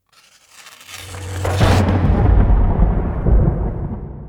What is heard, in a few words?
crack; DimDoors; Dimensional Doors; wylkermax